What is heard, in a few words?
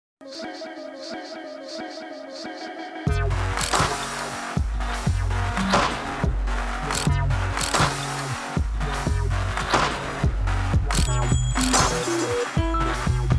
Drone Bump